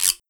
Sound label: Perc Guiro